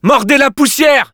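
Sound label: battle cry, French; Scout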